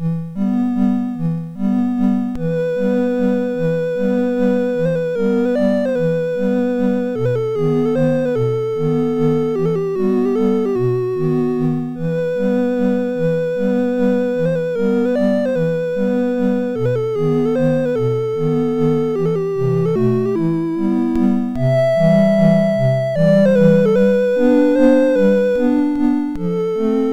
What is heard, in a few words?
MOS6581; MOS6581R4